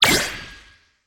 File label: spark light funnel